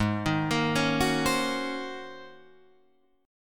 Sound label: Ab 11th Ab Guitar Drop D; arpeggiated; JGuitar